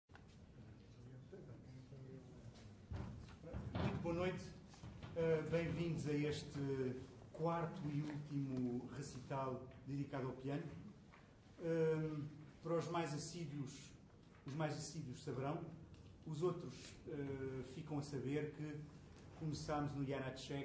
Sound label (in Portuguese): Para EBowed-Piano, Melódica e Field recordings